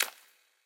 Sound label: footsteps mudroot; Fate The Cursed King